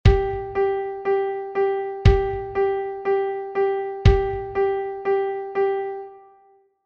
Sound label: quadruple accent beat